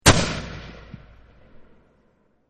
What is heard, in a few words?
Mortar Single Fire; War; VRSonic